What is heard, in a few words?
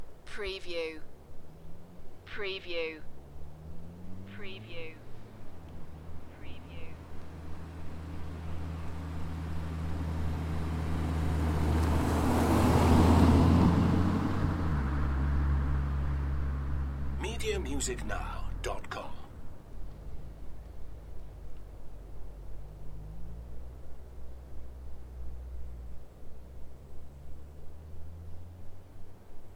Welsh Forest car park drive by quite fast; beanstalk audio